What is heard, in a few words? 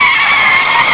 car screech; Effetti sonori